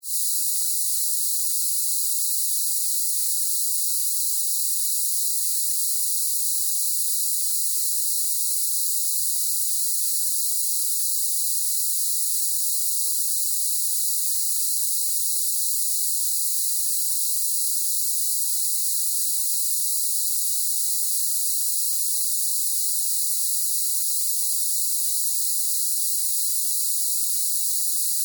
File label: Gas Leak Hose; Science, Fiction; Spacecrafts